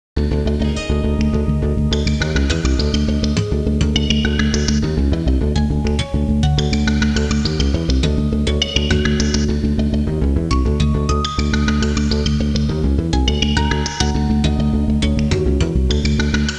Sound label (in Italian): musica elettronica